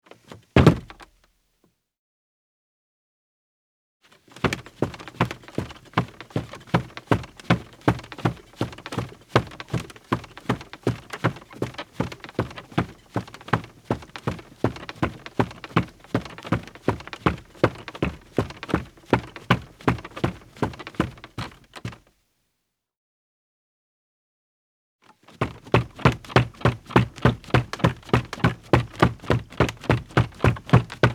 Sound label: Footsteps, Foley; Sound Ideas 6016